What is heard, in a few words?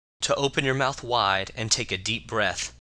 yawn